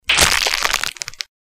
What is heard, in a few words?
gross splattering sound